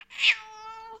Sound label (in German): katze